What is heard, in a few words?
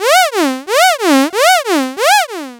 LFO, Noise